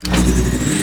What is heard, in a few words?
hipcutter idle start